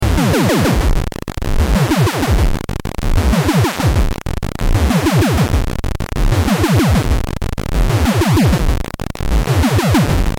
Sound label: EMS Logik